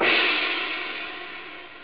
tsing, cymbals

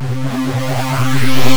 rise, Synth

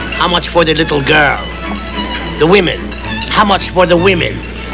blues

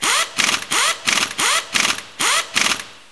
REPLACE; Andretti

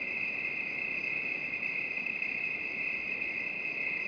forest cicada; specops

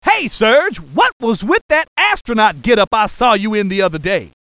Laser Lab Sound; Zap!; EdMark Corporation

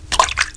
Dive

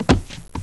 Keel Over

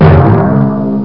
drum; dragon

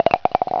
RATTLE

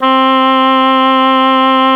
oboe, reed; Software Explosion 2 - Virtual Computer Pets; yamaha xg; gm tones

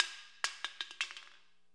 can beer; kingpin

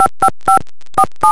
phone

